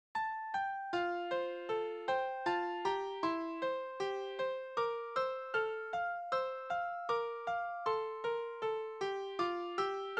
easy piano solo; The First Noel

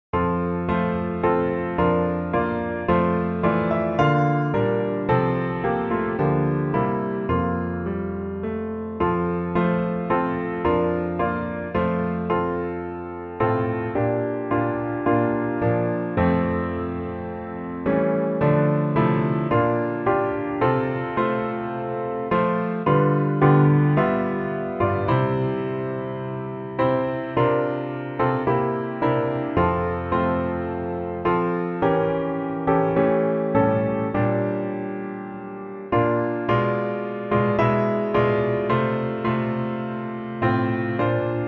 piano

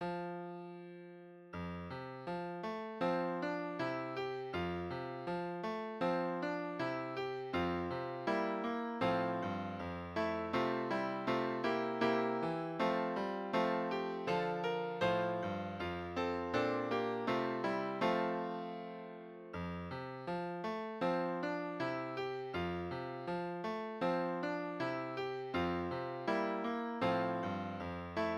Piano Solo; Once in Royal David s City

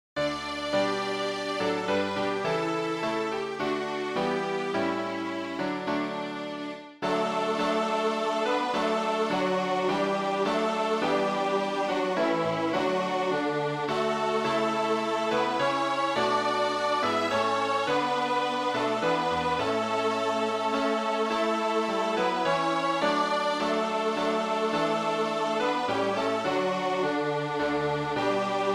Accompaniment; We Gather Together

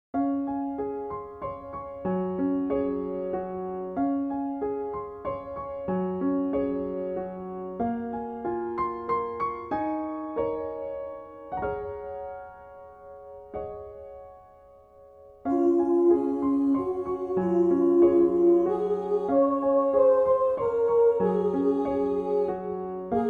Violin; Primary

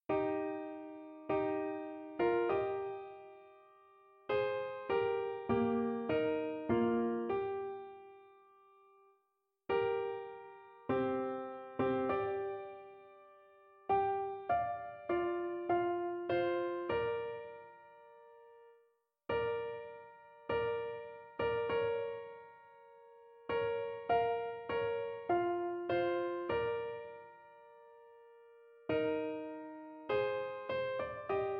Solo Reed Line; God of Our Fathers

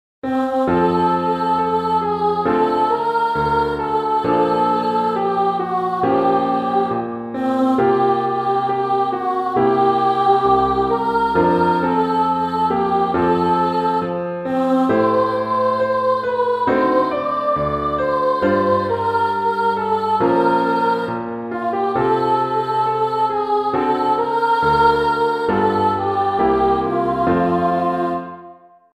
Primary English Version

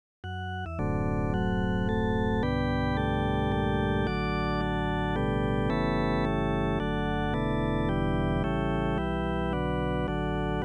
organ freeform; Now Let Us Rejoice

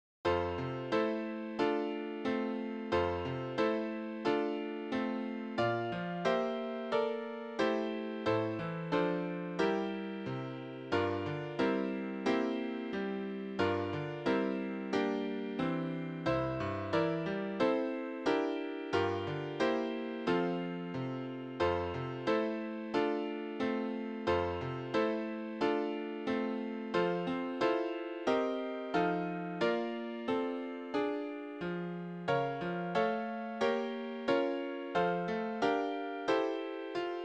easy piano

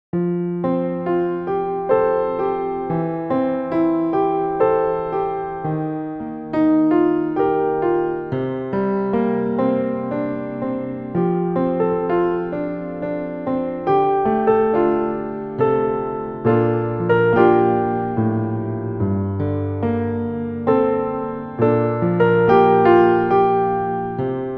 piano

